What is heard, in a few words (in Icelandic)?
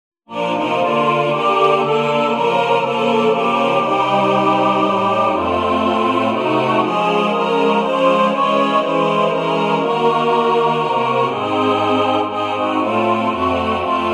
Raddir